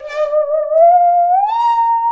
saw danger, music, zombat

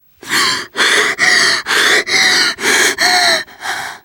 exertion critical, teen girl; player; survivor; voice

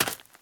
gravel, footsteps run; survivor

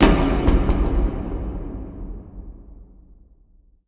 mall random, ambient

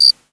cricket single

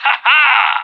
whack popup; moustachio

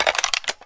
scout clip in; weapons

